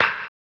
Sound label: HAMBONE, Vocal Blues Soul; Spectrasonics; Planet